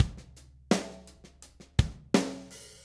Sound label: Drums, Rock